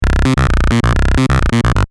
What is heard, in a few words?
SYLENTH1